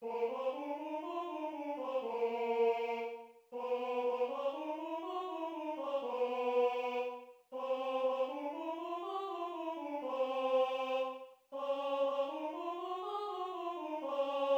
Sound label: warmup for choir